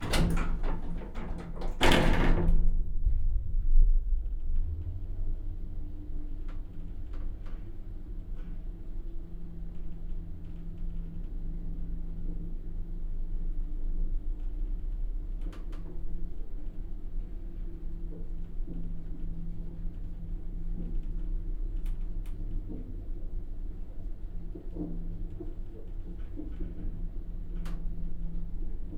Elevator Door Impact Metal Rattling Humming Driving; The Soundcatcher